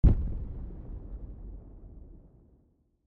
AA Shell Blast

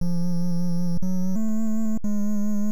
Silver Horn; Ultima IV Quest of the Avatar

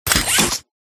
hand break

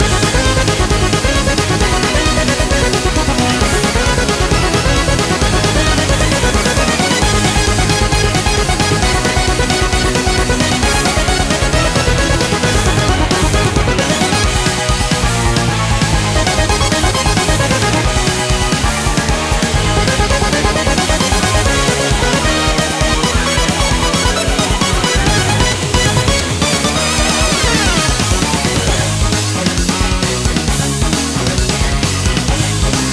BOSS Main Loop; Teenage Mutant Ninja Turtles Shredder's Revenge

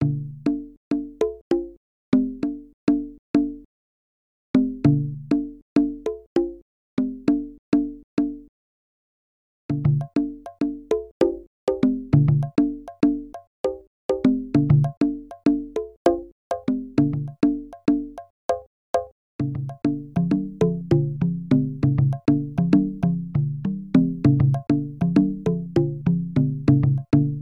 Cave Bongos

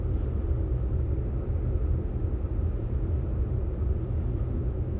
Ambient Lab Hallway; Among Us

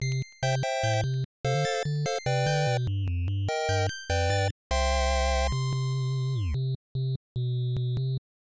Title Jingle; Cclub Disk X; X68000

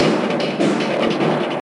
Desert Percussion

